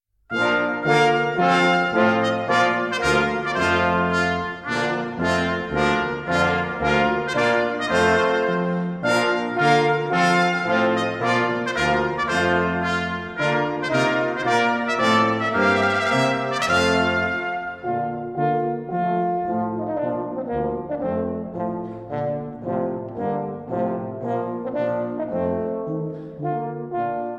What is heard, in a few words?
trumpet tune, brass band; purcell